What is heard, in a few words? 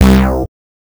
ASaw Leed Low C